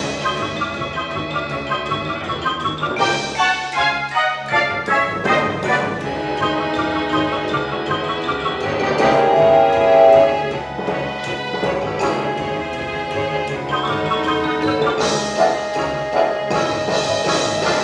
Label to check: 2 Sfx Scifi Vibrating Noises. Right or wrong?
Wrong